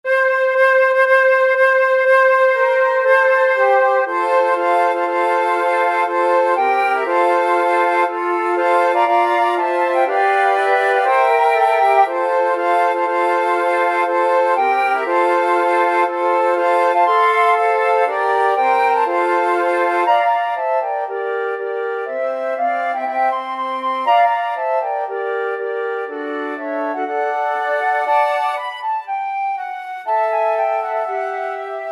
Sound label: wedding march, flute quartet